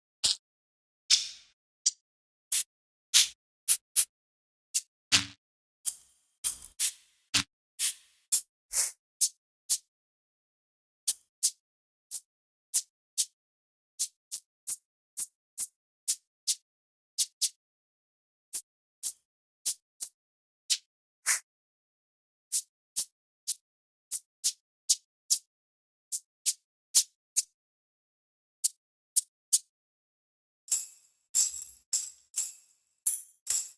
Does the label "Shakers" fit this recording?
Yes